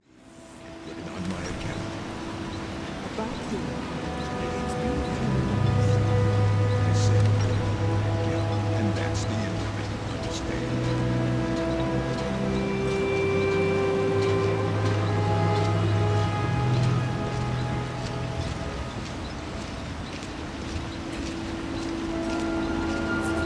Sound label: Music & Ambience, Night; 1hr; Raven Rock; Skyrim